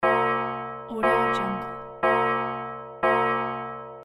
alarm clock bells

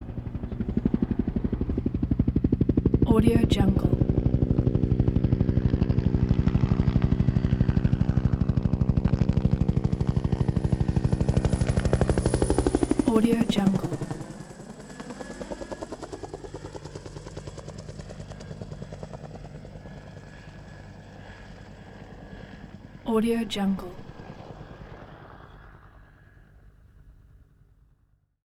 bell huey helicopter

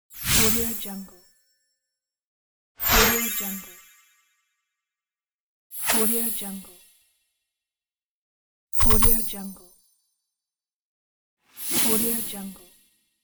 magic swoosh

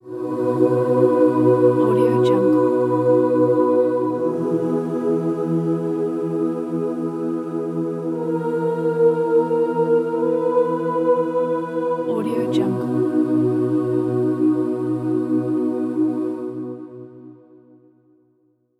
a heaven choir

